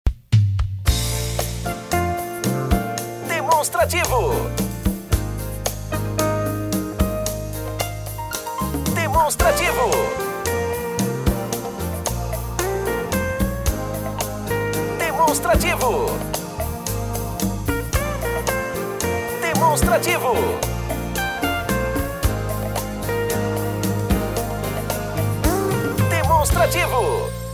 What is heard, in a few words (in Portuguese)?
tema espera